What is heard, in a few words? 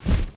phoenix death small flap; smite; npc